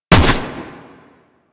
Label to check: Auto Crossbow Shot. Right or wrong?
Right